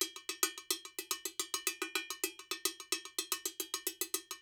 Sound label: LOOPS 108, NYC Percussionworks; Sampleheads